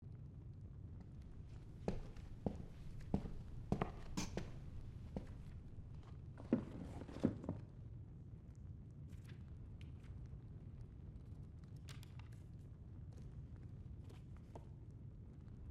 library loop, ambient; everdawn

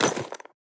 warehouse collect; idle mining empire

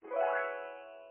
ticker

